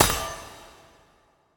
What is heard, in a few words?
UI Metal Armory Tab Wet; User Interface